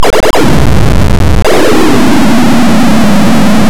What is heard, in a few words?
thunder; Last Crusade